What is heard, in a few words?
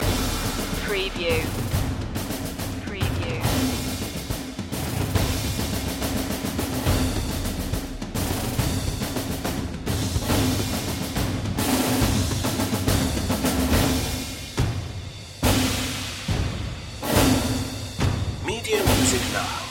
percussion only